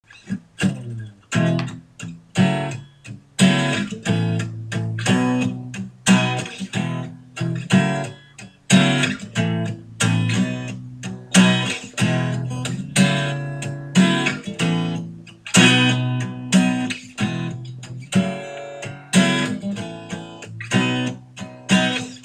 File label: acoustic guitar 1-2-4-5 chord progression